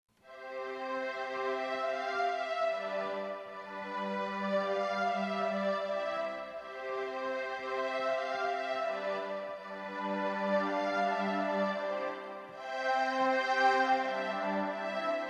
poings instr

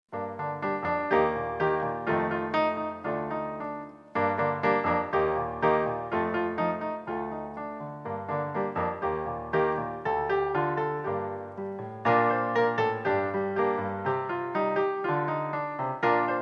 dame souris instr

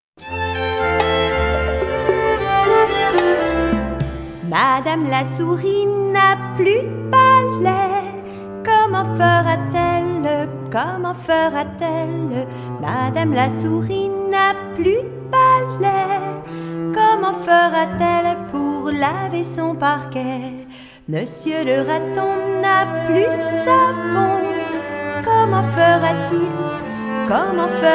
souris chant

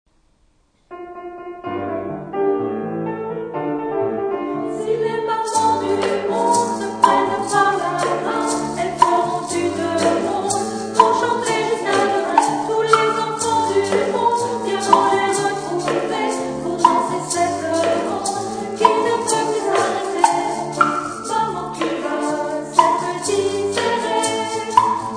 mamans chant

